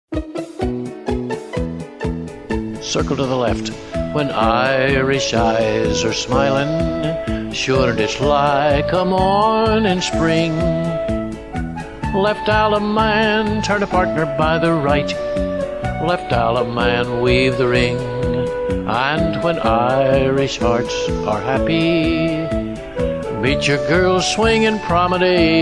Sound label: vocal